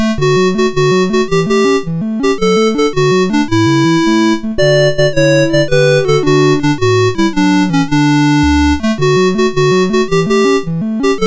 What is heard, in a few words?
Marching, MOS6581; Bonnie Blue Flag